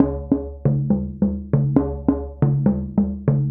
Mallet Percussion; Sonic Foundry (Sony Creative Software) - Essential Percussion

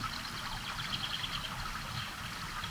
Waterway B Dark

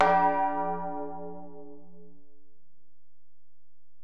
Bell, Alesis DM-PRO

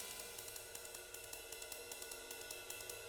310 BEBOP, Living Drums; Sampleheads; Peter Erskine

